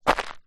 foley footstep single stoney path; zapsplat

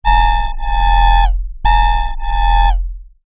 science fiction robotic drill burst; zapsplat

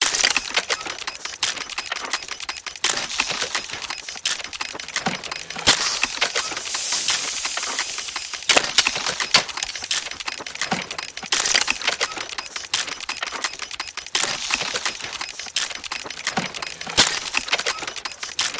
Steampunk Mechanical Loop; PMSFX

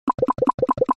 multimedia game designed pop wet seq

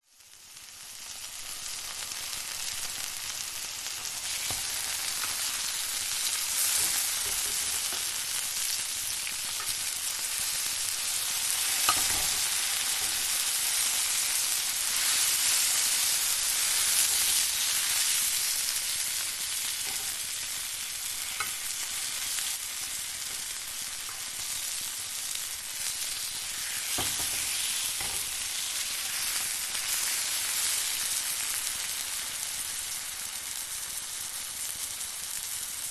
food tomato cook fry sizzle pan

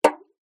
foley drink can cola impact liquid inside

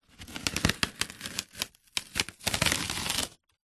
foley cardboard packaging rip tear open; zapsplat

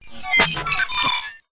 ui retro sci-fi transition; silverplatter audio

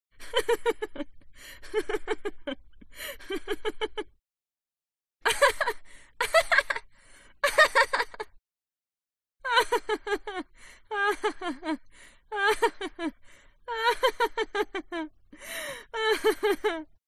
HUMAN VOCAL Female Laugh; smartsound

